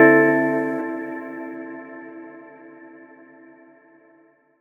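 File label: Guitar, Chords Tones; Equipped Music; Loungin House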